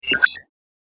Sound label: Computer Beeps; Starcraft; Spacecraft Sound Effects; science fiction sound effect